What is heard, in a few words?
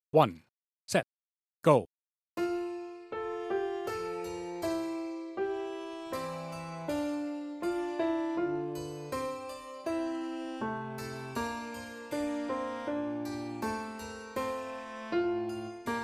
Sarabanda 80bpm; Corelli; tmea solos